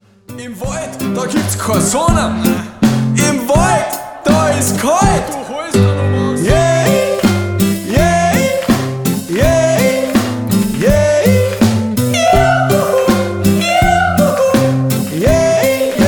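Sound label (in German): Jäh-ih (Jodel Song); schwappdidu und peperoni; kindermusical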